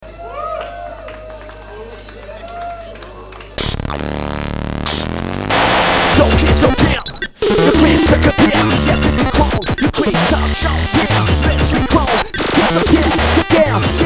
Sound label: chip; NANTES